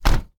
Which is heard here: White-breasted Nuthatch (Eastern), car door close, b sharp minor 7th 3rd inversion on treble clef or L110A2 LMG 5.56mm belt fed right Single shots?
car door close